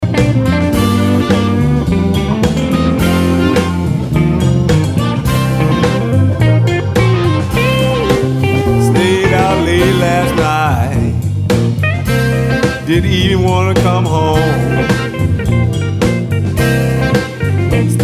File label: Boogaloo Blues